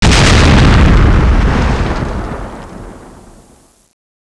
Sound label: explosion